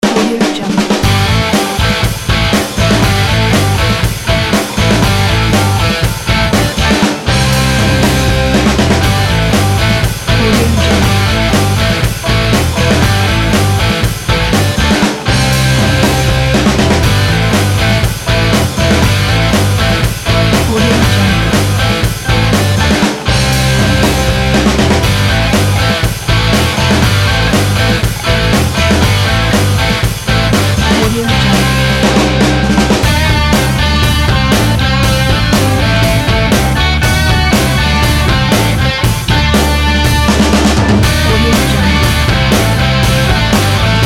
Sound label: hard rock